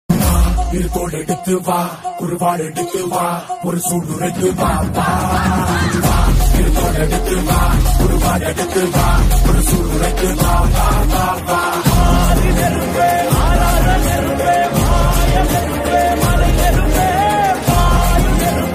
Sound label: Song bgm Ringtone; kanguva; siva